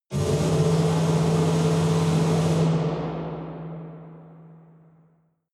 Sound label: air flamethrower